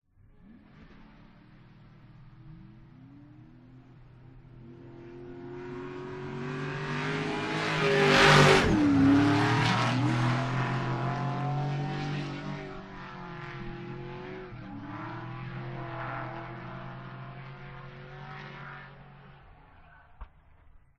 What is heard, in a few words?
drift cars passing by at top speed on a straightaway; Sound Ex Machina